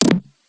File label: equip aggro; downworld